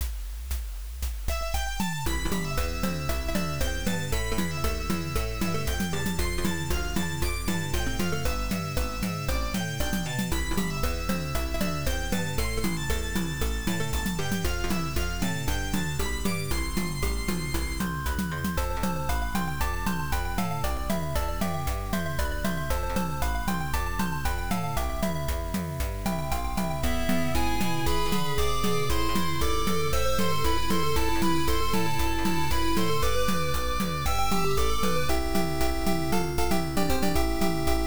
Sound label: Clear BGM Peaceful Forest; Sorcerian Utility Vol. 1; Sharp X1 turbo; PSG; OPM; Mystery of Ruby